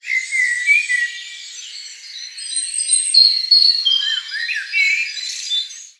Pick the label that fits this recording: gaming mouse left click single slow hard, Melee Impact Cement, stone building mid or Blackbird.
Blackbird